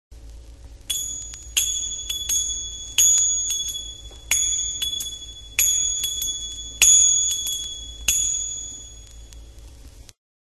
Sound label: Crotales Percussions